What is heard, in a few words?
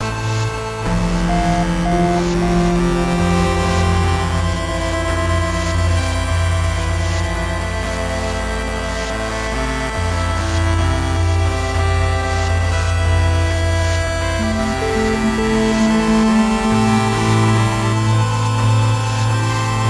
AMB LEVEL DnDnDn; super time force ultra